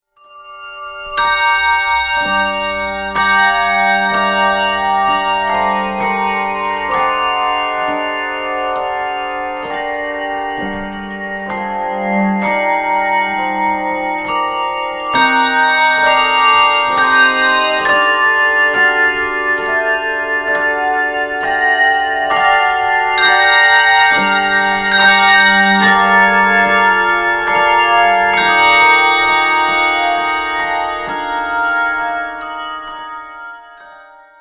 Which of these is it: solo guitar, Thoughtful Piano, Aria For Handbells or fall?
Aria For Handbells